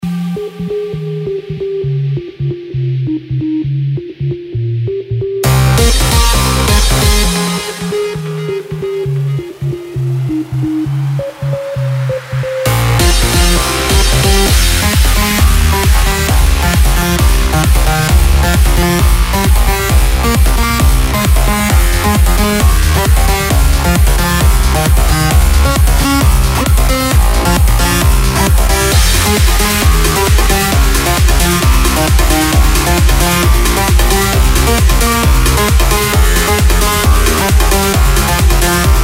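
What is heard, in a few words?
cosmic electro house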